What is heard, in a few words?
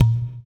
EQ Perc, Percussion Mix